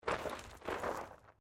Bicycle Mountain Bike Move Gravel, Bike being thrown around to simulate crash impacts